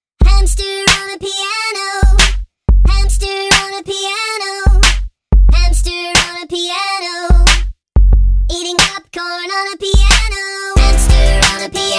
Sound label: Hamster On A Piano (Eating Popcorn)